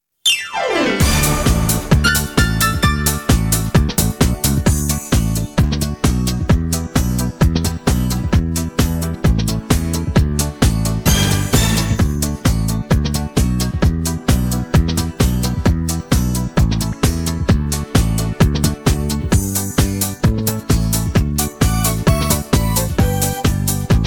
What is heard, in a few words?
instrumental